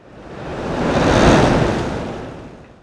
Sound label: Wind Gust; gesource; DuClare Chateau